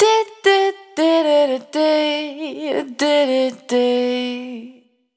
Output, Single Voice Melodic Loops

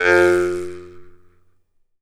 Jaw Harps; E-MU Producer Series Vol. 7 – Old World Instruments

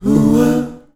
HOOUH, VOICE; Rarefaction - Poke in The Ear With A Sharp Stick

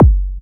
Very Q, Kick Drums; Zero-G; Soundclash

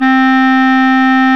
Clarinets

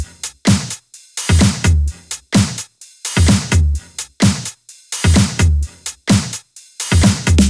Loops 128BPM, Drumloops

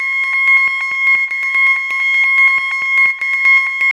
ECHO, Tekno Industrial; East West

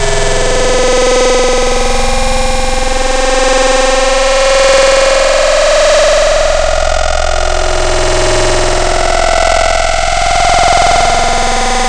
Screecher, Wavetables; Antidote Advent

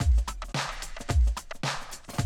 Classic DrumLoops

